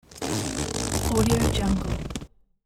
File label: zipper unzip whoosh; hollywoodedge